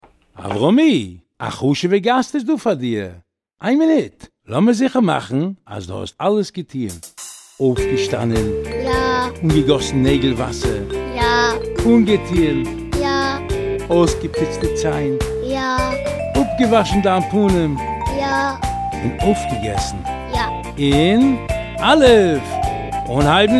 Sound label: niggun